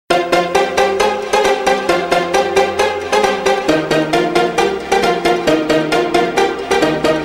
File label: Hall Sms